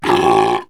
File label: bear; gfactory; creature